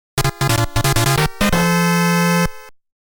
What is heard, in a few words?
Match Start; Nekketsu Kouha Kunio-kun Ongakushuu